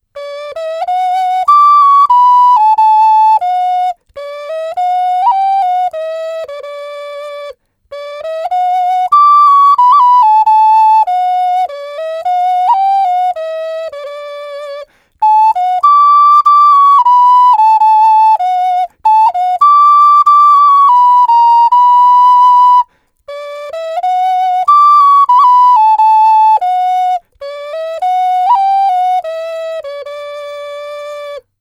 Oc 4 hole Last Rose of Summer, ocarina

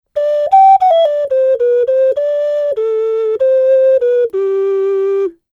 Tenor jingle, ocarina

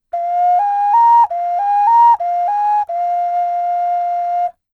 PreColumbian 2 hole shell jingle, ocarina

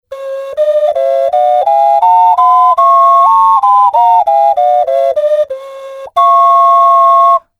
Alto Duet scale, ocarina

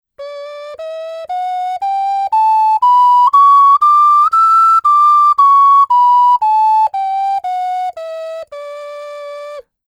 Oc 6 hole scale, ocarina

